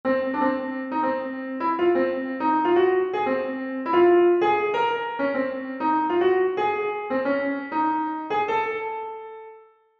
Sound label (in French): melodie